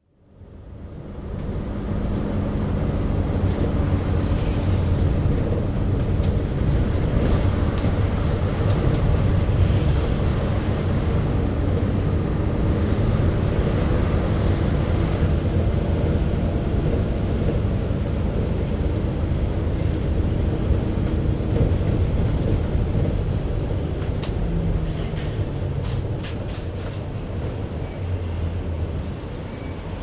Routemaster Double-Decker Bus, interior, constant run on upper deck, buzzer pressed; buzzer pressed at 0m39s; BBC